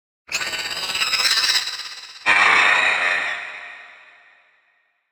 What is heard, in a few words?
Giant Rat Death